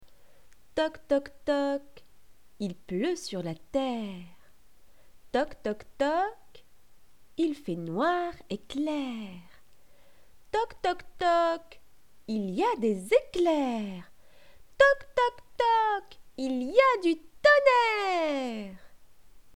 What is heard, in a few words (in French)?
Toc toc toc il pleut sur la terre voix